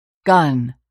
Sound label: gun